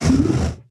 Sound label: stomper death big, enemies; Factorio